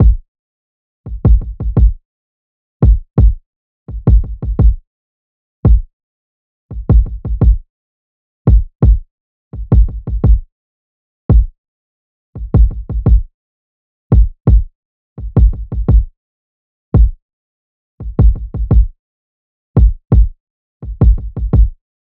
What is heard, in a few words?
Drum Loop - 85 BPM Kick; Loop Stems; Cymatics; DOPE DRUMS VOL. 1; Find Drum Loop